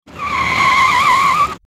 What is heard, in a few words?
sliding, sliding tires; sliding car sound; teststrecke game